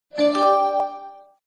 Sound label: new email; msn